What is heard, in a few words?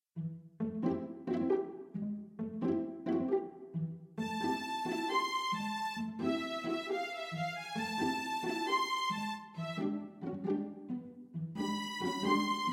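TANGOED for string orchestra or string quartet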